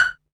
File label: PIZZ, Dance Mega Synth